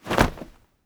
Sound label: Whoosh Cloth